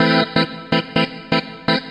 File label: 125 round trance; SYNTH AND ELECTRONIC LOOPS